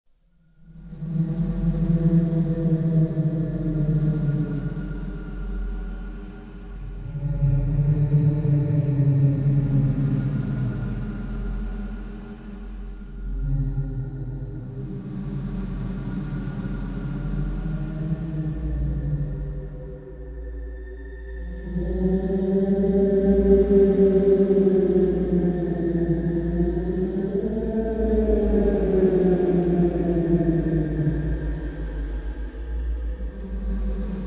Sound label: scary ghost sound effects